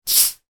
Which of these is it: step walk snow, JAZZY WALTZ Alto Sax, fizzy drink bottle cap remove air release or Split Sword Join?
fizzy drink bottle cap remove air release